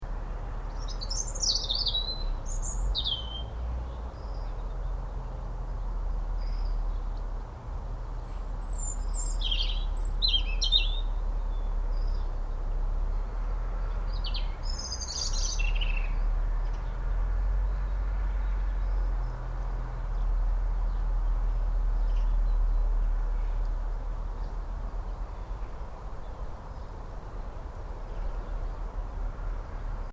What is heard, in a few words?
Garden (Residential): Ambience, Birds, Slight Road In Background; Outdoors; Backgrounds; Outdoor Sound Effects; Stuart Duffield